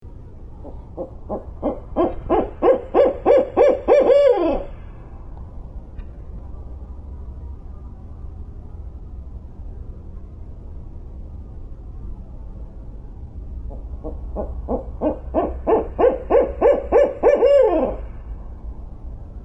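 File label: Barred Owl woohoo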